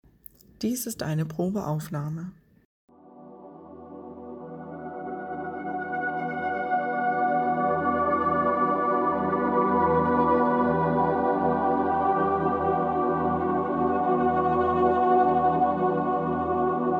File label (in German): 741 Solfeggio Hoerprobe Blue Water